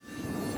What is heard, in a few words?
missions complete objective